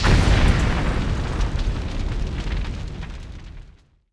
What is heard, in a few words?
City Explosion; Sid Meier's Civilization 4 Complete; Warlords; Units